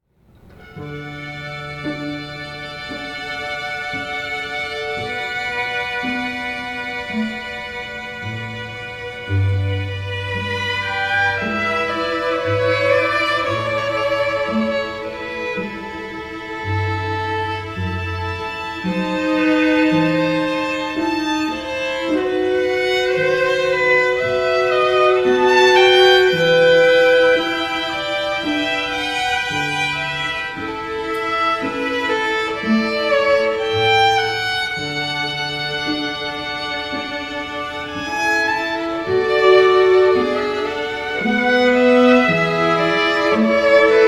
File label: Air in G, string quartet; little rock string quartet